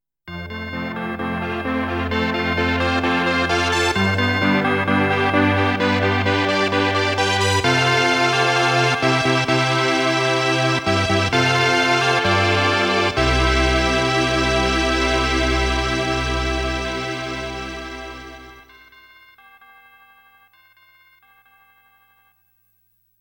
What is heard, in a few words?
Wedding ceremony; Estpolis Denki 2; Lufia 2 Rise of the Sinistrals